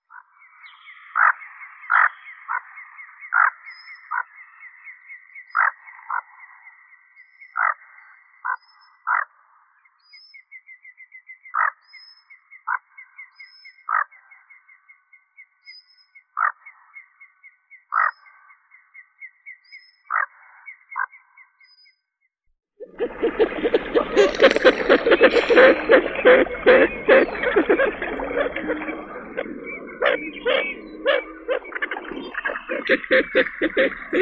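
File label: bufflehead, bucephala albeola; gogol malii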